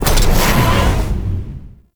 Void Dragon Firebreath Mech; Warframe; Duviri; Weapons

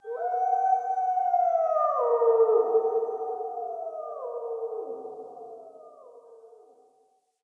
Quest Wolf Cub Howl Stinger Breadcrumb; Animals; Wolf Cubs; Howl Stingers; Orengal

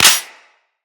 Pistol Mech; Tenno; Cowgirl Pistol

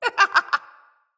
Laugh; Fable Of Garren Rood; Deora

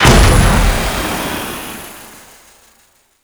Radial Blind; Warframe; Excalibur

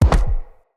Bow Shot Charged Punch; Weapons; Player; Josalfar Bow

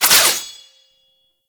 Brood Impact Launch; Warframe; Oraxia

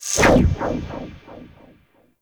Stalk Reactivate; Warframe; Ranger

